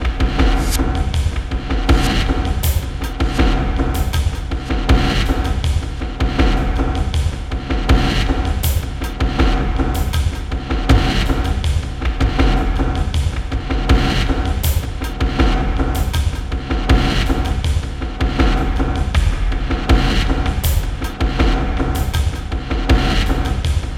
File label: Industry, Percussion